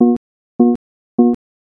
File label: min confirm, ui sounds; mikroconsult